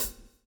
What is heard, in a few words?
SWNG